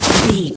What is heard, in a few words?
footstep snow land